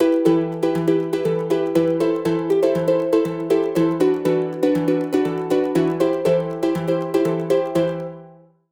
Hybrid Advanced Ethnic; midi wizard 2; Unison

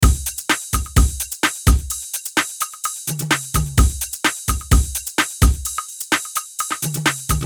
Disco 128BPM

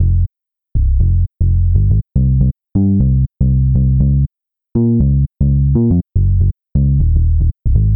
Neo Soul

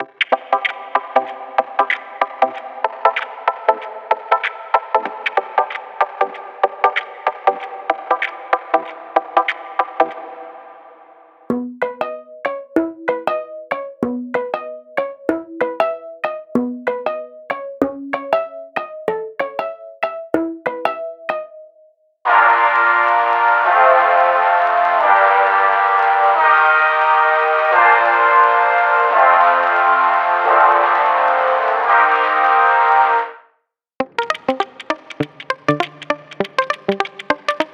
Reggae

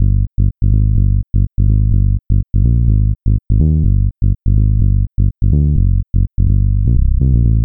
House Deep House

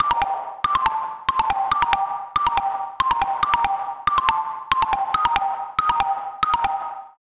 Melody Pluck Trap; midi wizard 2; Unison

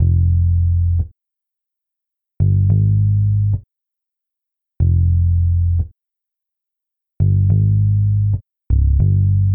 Folk Indie

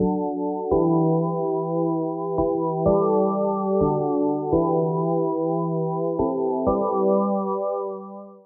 Chords Full House Deep House; midi wizard 2; Unison

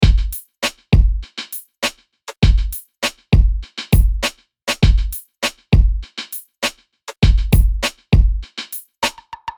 Ambient Downtempo 100BPM

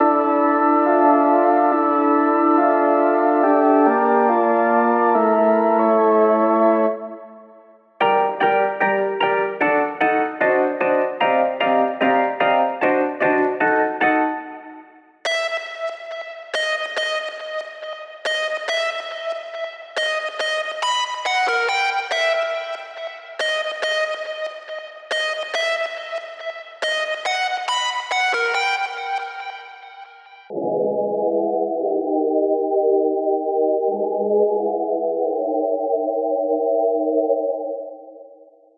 UK Drill UK Grime

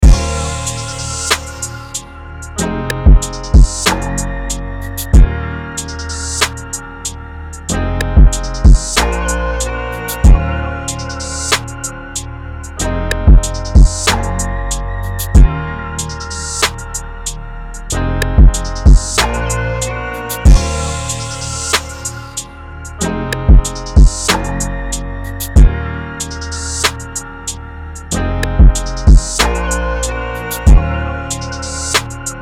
188 BPM Bbm; UNISON DEMO; 4eva

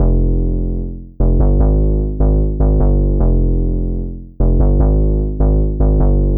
Hip Hop Rap Bass

